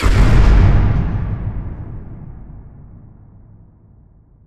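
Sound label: explosion distant medium; props; explosions; Portal 2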